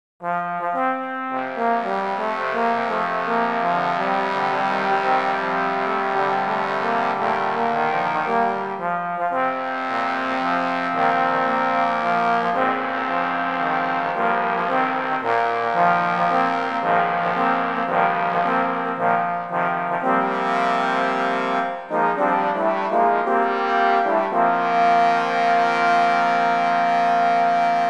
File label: Trombone Opening; TROMBONE CHOIR